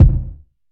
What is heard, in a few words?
big kickdrum sound f key